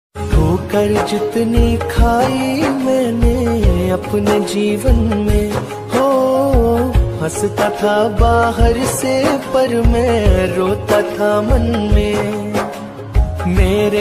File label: hindi simple ringtone, sad ringtone; khatu shyam